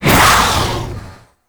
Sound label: Baby Dragon Split Shot Cast A; Warframe; Duviri; Duviri Void Dragon